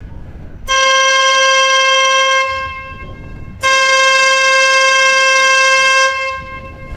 sustained vintage car horn honk; vehicles

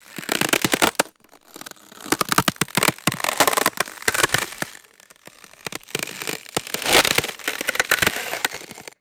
slow brittle ice cracking and straining

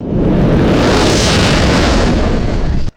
roaring fireball whoosh pass by

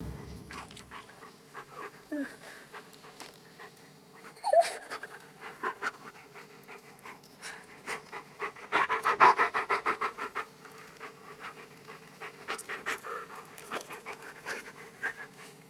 dog panting sniffing and whimpering; animals

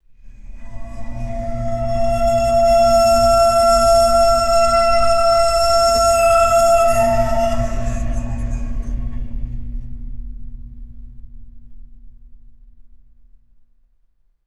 high pitched ringing metallic screech

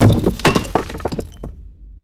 heavy cinder block impact with debris

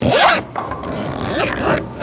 heavy duty zipper pulled closed

